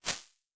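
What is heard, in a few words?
ambience rock lead guitar, small rooms; Ableton; Convolution Reverb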